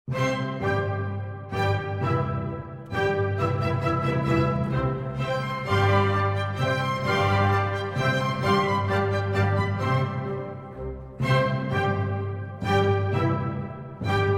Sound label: German Dance No. 3 in C Major, K. 605 The Sleighride